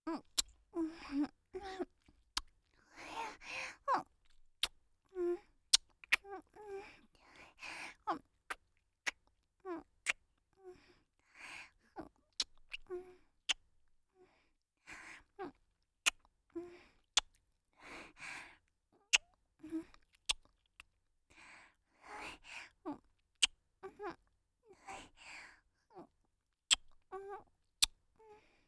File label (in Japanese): キス